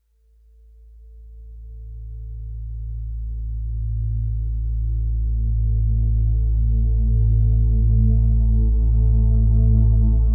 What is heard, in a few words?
ambient